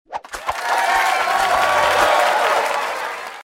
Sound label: wine; FreeKill; 杯